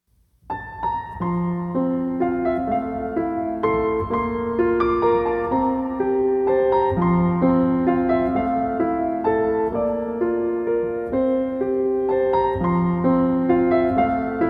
Piano and Cello Duet

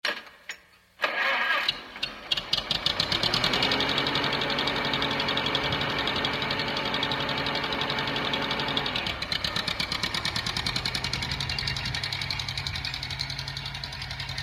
Tractor; SOUND EFFECTS1

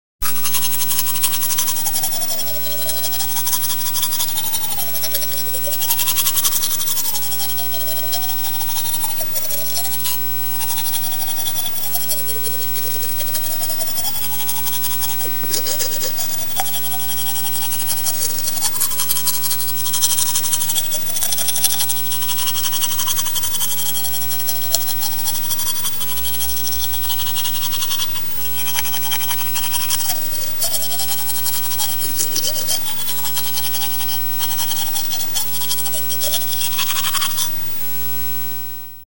brushing teeth; SOUND EFFECTS1

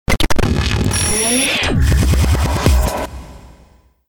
Out and Back Fizz, DJ sounds

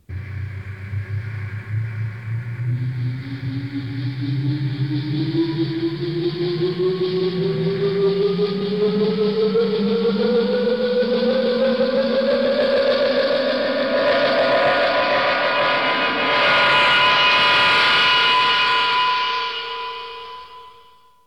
Dematerialization, Sound Effects; Star Trek Original T. V. Series Sound Effects

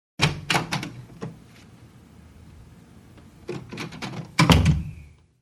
Door opens and closes; SOUND EFFECTS1

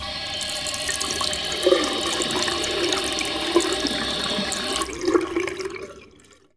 W.C. TAP, TOILET

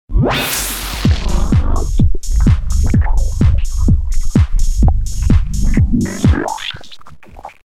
Gloppy Groove; DJ sounds; Mtrax

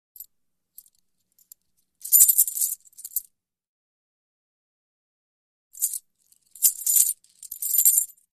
Coins Jingle; Hollywood Edge Foley Sound Library

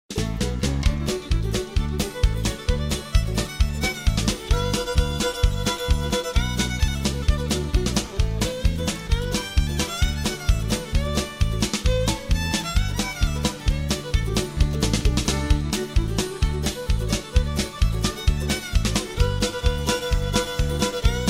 COUNTRY FIDDLERS CONTEST

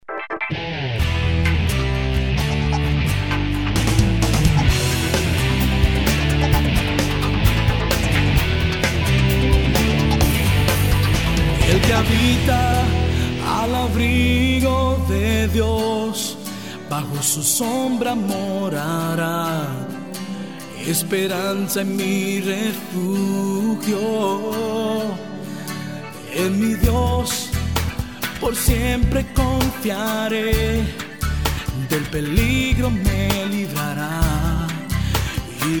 Rock

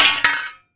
IRONWARE, IRON WARE HIT; 1000fx

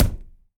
wood, smashing; 1000fx

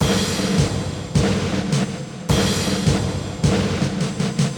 march, musical; 1000fx

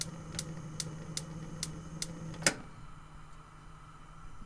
gas heater; 1000fx

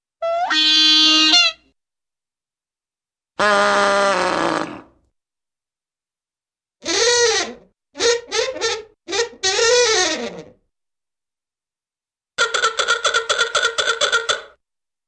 oooweeaaa' Horn Sounds; Hollywood Edge Cartoon Trax

